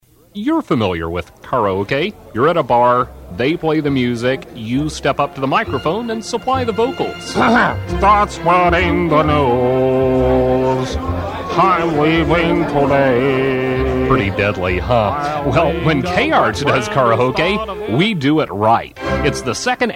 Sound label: Opera Karaoke